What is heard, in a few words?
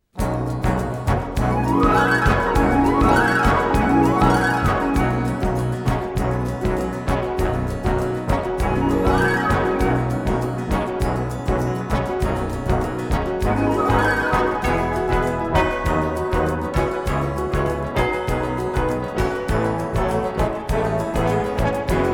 instrumental; il etait une fois; les decouvreurs